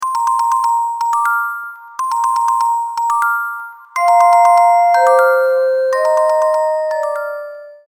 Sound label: Treo Ringtone